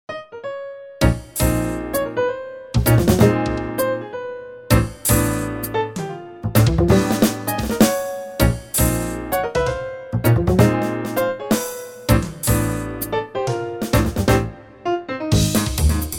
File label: Acoustic Trio